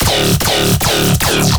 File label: Instrument Layer, bass